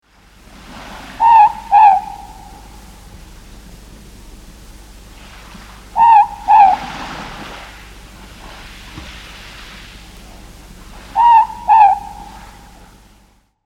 Ruru Manu; Te Ao o Taonga Puoro

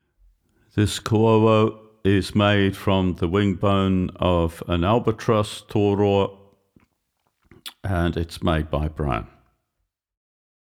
Koauau Toroa

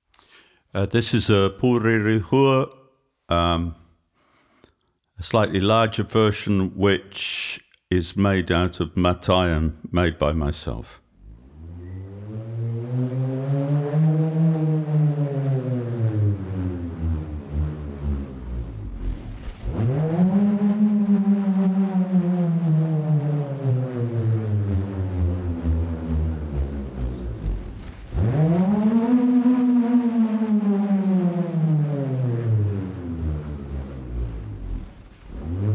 Purerehua Nui

